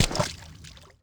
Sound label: water walk shallow